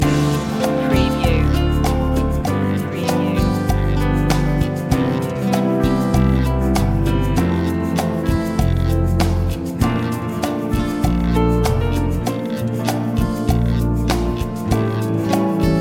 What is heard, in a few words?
romantic guitar loop